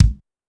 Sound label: Kick, Pitched Up; Clavia DDrum-AT